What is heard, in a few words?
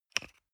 toothpaste screw cup open soft single